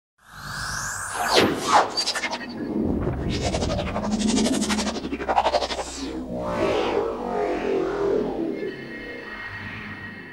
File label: Transformer Effects